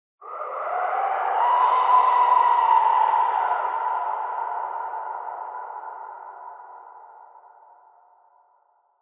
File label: ambience bad death whistle; smite; conquest